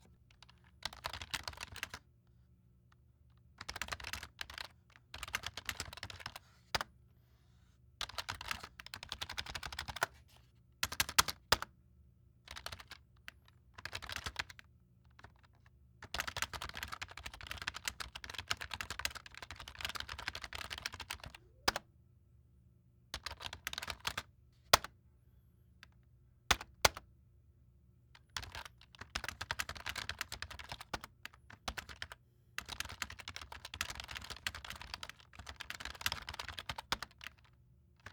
Hp Office Keyboard

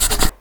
Paper Scrawling

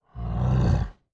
Growl

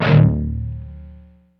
Muted D 5th

